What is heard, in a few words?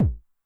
BD Med Tune Long Decay, 909mod, BASS DRUM; classic machines